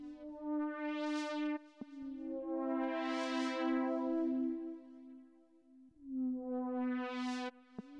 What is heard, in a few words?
Trance